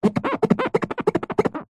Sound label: office whiteboard rub fingers 2 squeak fast